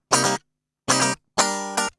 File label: GUI 120 5aC; Best Service Gigapack 2